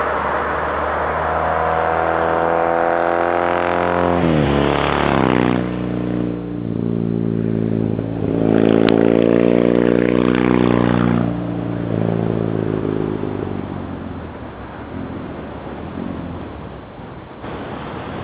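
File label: Norton, motorcycle; 1976 Norton Commando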